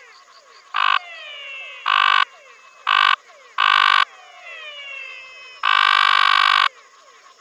Scinax acuminatus; herpetologia; cantos

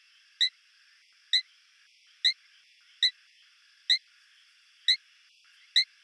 Adenomera guarani; herpetologia; cantos

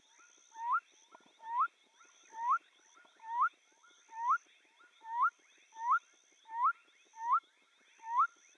Leptodactylus elenae; herpetologia; cantos

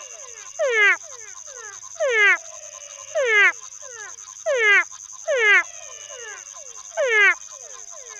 Physalaemus santafecinus; herpetologia; cantos

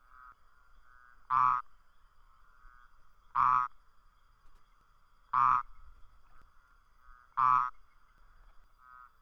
Odontophrynus asper; herpetologia; cantos

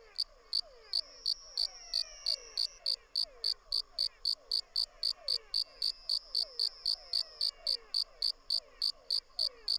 Pseudopaludicola boliviana; herpetologia; cantos

